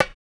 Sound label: Bongo LO, percussion; MARKYDRUMS